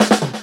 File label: Loops Fills; Vengeance Samples